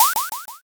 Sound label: draw card